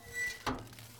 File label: bin open